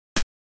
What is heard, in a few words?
slime spitter